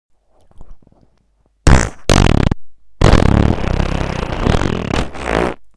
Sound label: small fart